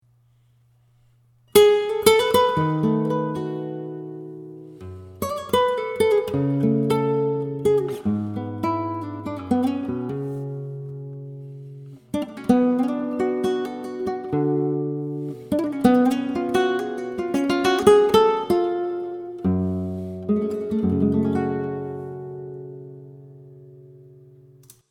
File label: milonga flamenco introduction